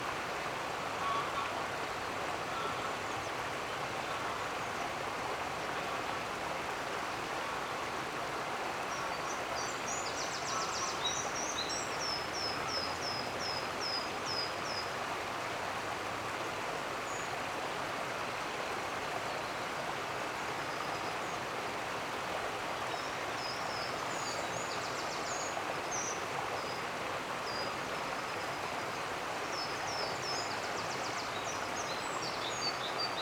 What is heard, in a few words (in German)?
Wasser